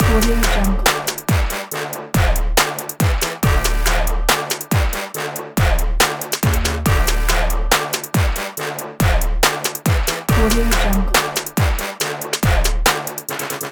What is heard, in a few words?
extreme sport loop